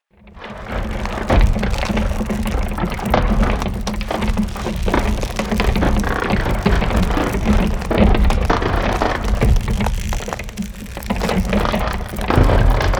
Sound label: ice medium pressured